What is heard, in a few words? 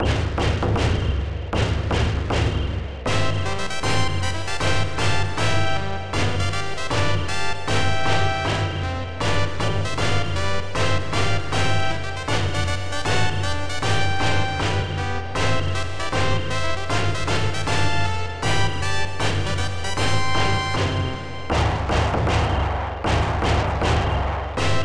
Hitting March